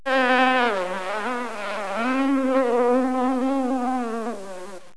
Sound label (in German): biene